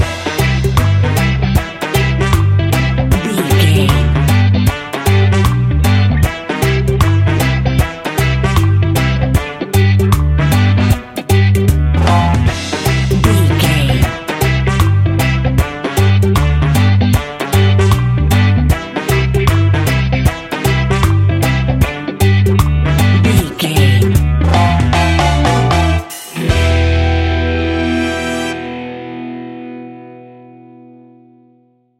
good summer reggae vibes, Classic reggae music with that skank bounce reggae feeling.; Great for summer BBQ